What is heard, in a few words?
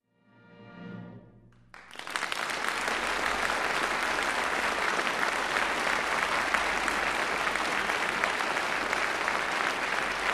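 concert applause, stormy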